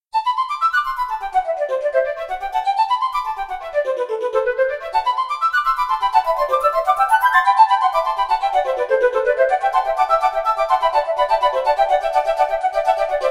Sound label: birdsong, chiptunes